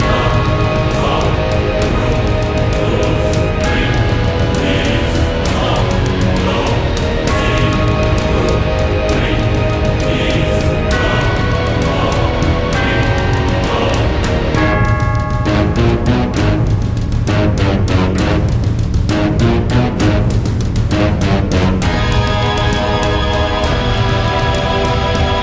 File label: bgm battle; witch spring 3 android ios mobile gamerip 2017